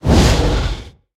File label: roll intense; smite; god geb skin7